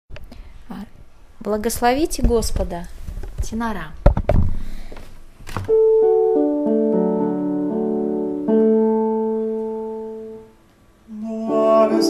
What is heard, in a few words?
tenor; Blagoslovite Gospoda